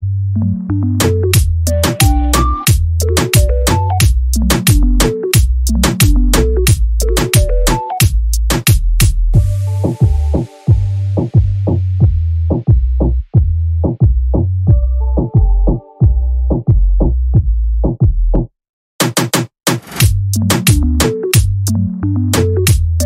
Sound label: INSTRUMENTAL